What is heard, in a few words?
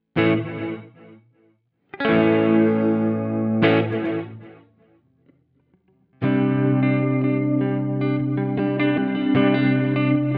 Reverb Soft Focus; Keeley Realizer